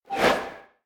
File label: Email Good; Coolpad; C558